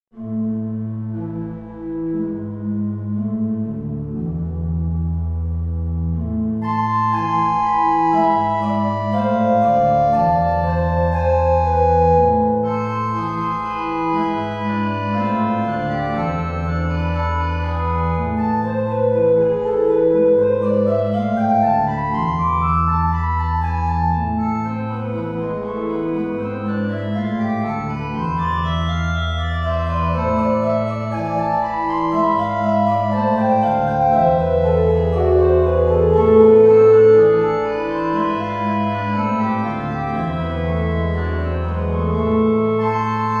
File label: Organ Ostinato